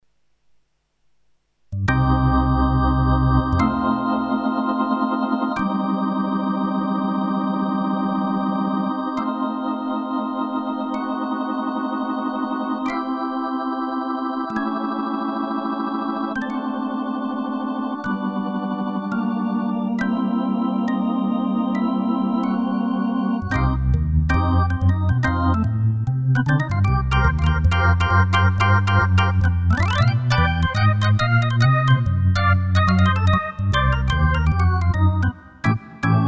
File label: Jazz Hammond